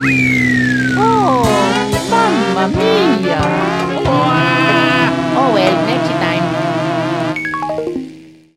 finish grand prix fourth or lower place, soundtrack; GAMECUBE; MKDD